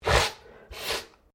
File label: MALE SNIFF; Male Vocal sounds; Sick (Sound Effects:Human)